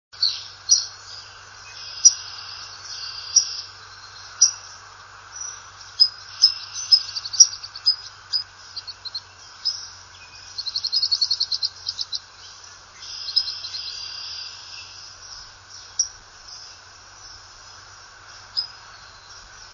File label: sandpiper solitary; birdsongs